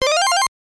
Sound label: Correct; Super Jeopardy; NES